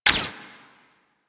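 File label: Primary crossbow Mech; Warframe; Weapons; Tenno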